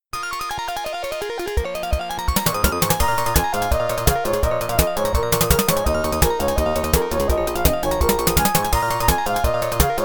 BGM (Faster); Block Block; arcade

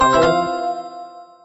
Level Exit; The Adventure Pals

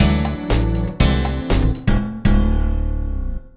Match Complete; Centre Court Tennis; N64

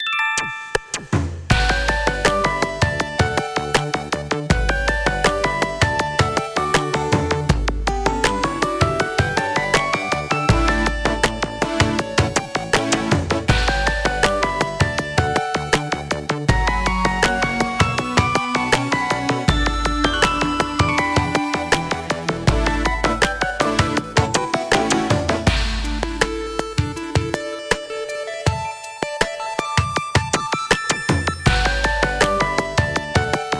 Jingle Option; PS Vita

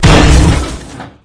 Vehicles Generic

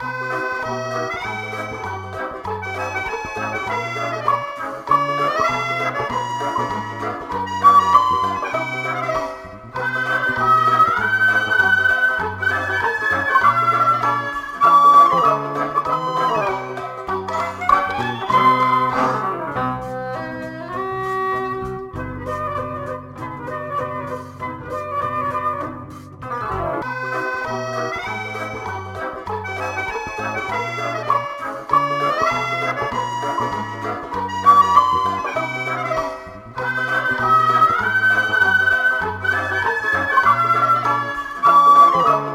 Radio Music

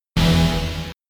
Replay Chord

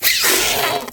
insect attack; Insects

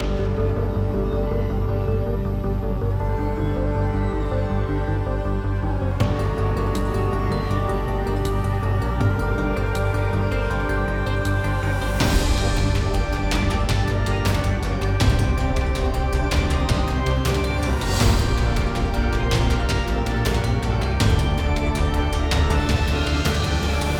Boss Music; Robbie Swifthand and the Orb of Mysteries; PS4

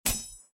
sword Metal; Out of Reach: Treasure Royale

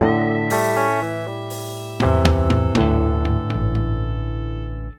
Lose; rusty gun

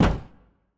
Car Destruction Hit

